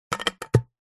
household blender lid close

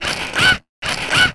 science fiction robot movement repeat; sound effects one

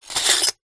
glass shards x2 scrape together; foley

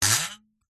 cartoon metal ruler twang wobble

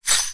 technology mixing desk fader slide fast; zapsplat

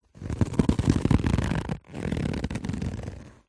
cardboard envelope box handling rough fingers rub; zapsplat

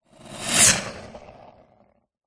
sport ice skate pass by fast designed; zapsplat

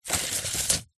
industrial tape measure metal pull; zapsplat

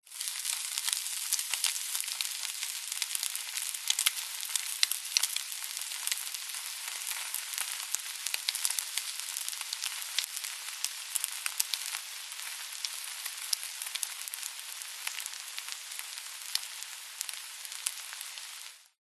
food egg frying pan hot oil sizzle; zapsplat

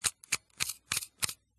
smena symbol camera shutter speed dial; dpa4060; pmsfx

